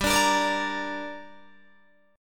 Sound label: G Minor G, Guitar, DADGAD